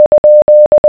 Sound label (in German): fragezeichen, morse